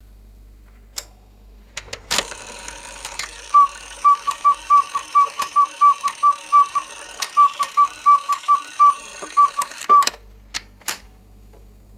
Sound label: mechanical cuckoo clock striking ten